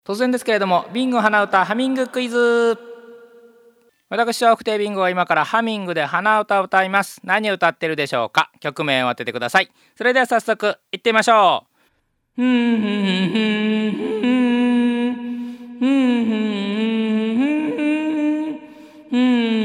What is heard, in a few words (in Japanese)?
hanauta